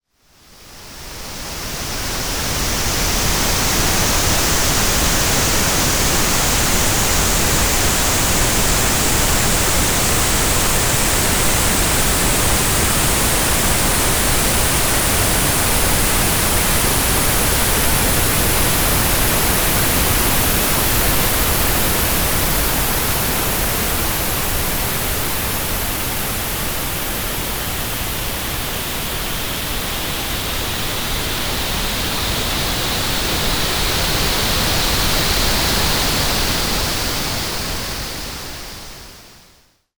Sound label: Tinnitus 2kHz to 12kHz Sweep and Fade